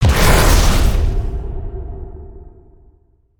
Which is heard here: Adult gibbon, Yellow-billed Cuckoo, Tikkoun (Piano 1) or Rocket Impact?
Rocket Impact